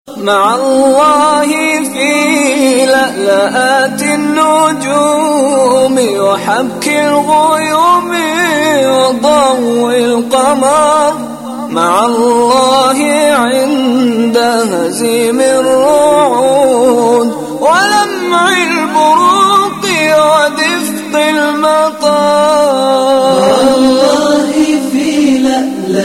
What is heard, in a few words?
islamic Ringtone Maa Allah Ringtone